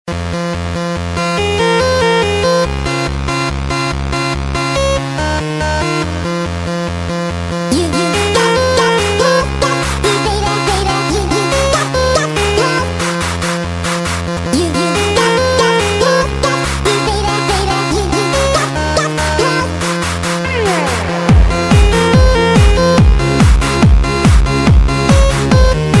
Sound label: House